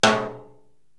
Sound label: Tom-Jazz Hi Rim; Korg TR-Rack